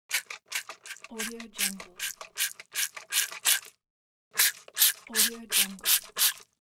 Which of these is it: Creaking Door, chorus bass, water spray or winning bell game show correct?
water spray